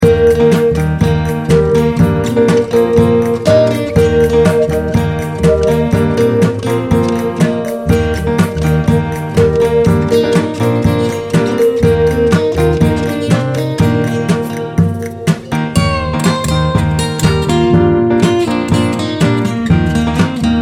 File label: solo